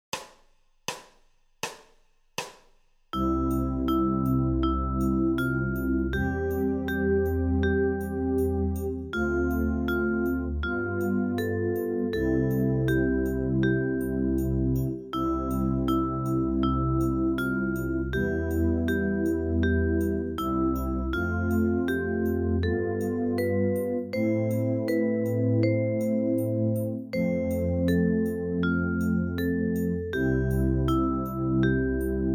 la nota mi3 canto popolare

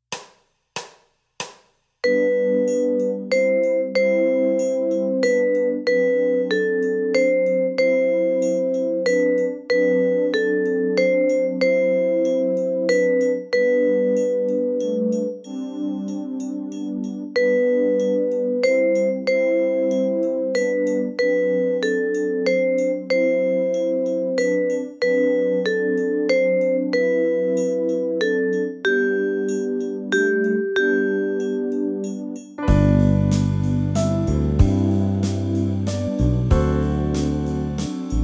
la nota do4 barcarola

